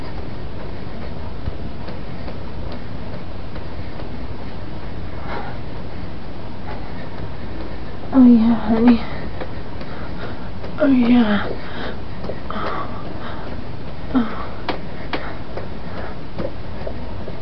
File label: oh yeah with tag, silly lo-fi orgasms